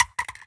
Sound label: phys mag drop smg metal concrete, magazine drops